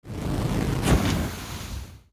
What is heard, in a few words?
npc fire golem strike